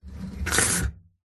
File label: bicycle pushbike front wheel brake stop sudden close up; vehicles; zapsplat